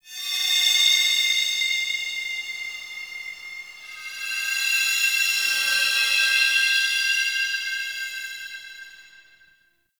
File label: Glassy Texture, Ambience; Warframe; Shadowgrapher